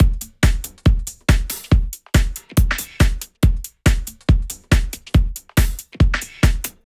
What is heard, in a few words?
Break Beats, 140 BPM